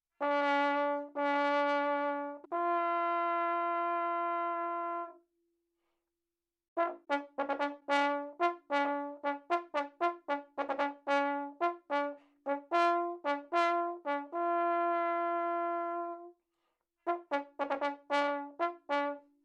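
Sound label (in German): Begrüßung, Allgemeine Signale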